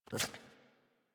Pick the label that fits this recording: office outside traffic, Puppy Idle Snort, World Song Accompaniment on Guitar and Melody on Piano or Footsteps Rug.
Puppy Idle Snort